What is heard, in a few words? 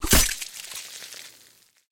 Blood Knife; Game rip; MiSide